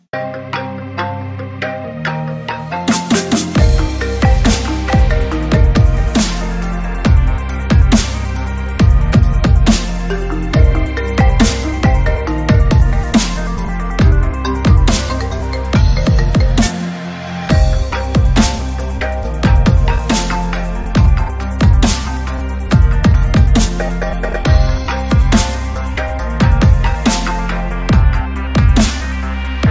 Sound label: Remix Ringtone